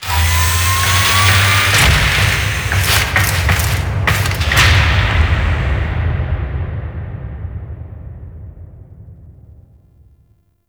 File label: Ship Relay Intro Undocking; Vehicles; Warframe; Liset; Liset Flight; Grineer Ship Liset